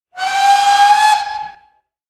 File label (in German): RhB Lokpfeife; Hinweistoene; Heidi